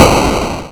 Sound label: splatter brick; coolmathgames; 60 second santa run